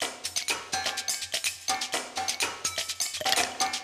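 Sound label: food world music paradise fight no guitar intro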